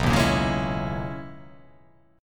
B Major 13th B down

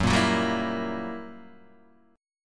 Fsharp 7th Flat 9th down Guitar Open D

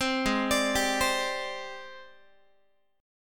Ab Major Sharp 11th Ab down Guitar; AbM#11 arpeggiated; 15,16,17,17,16,x

